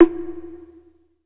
Expresso Bongo, Drum & Bass; Total Drum&Bass; 90 s Sample CDs; Hits & Bits

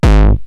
Roland TR-909 BD; BD Selection

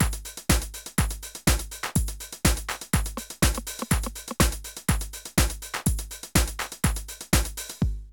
drum loops, House & Garage

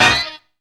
CONTROL HIT; Zero-G; Funky Elements

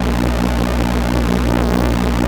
Korg PS3100 A#2; Back In Time Records; Korg Universe VOL-1

